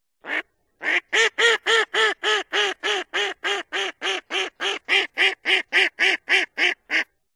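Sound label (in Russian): kryakva golos, mallard voice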